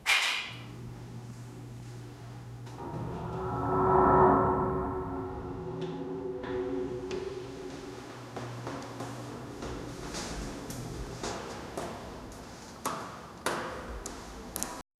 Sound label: entering the haunted hosp, abandoned